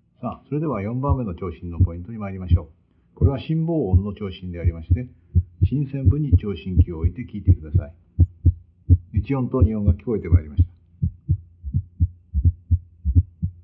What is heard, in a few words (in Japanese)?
心房音